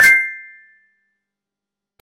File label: coin collect